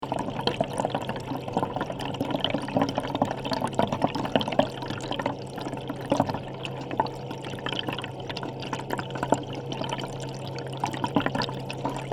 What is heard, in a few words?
Contact Mic Water Pipe